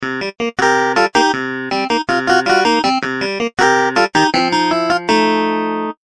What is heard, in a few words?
Clavinet D